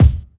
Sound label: low tone kick single shot d sharp key, kick drum sample tuned to the D# note